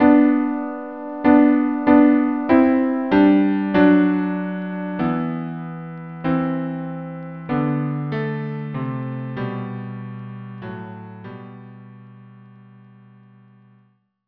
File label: cello duo; lo how a rose